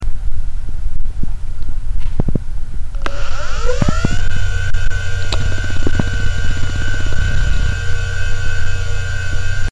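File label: Samsung old ok, hard drive; Почему у специалиста по восстановлению данных должен быть хороший слух?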